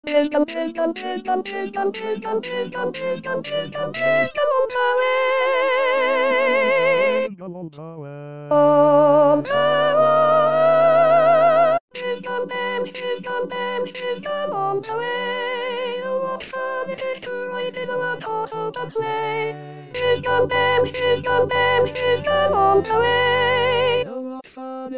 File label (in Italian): Jingle Bells 6 voci